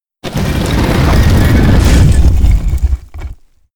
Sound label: stone door close